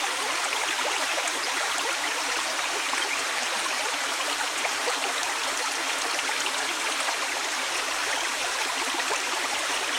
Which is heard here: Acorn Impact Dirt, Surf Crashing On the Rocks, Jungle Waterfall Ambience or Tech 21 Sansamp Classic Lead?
Jungle Waterfall Ambience